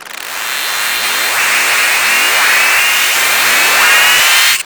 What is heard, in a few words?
FX; Big Beat Samples & More; AKAI; OTHERFX KIT